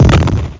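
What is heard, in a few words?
pistol; Graliens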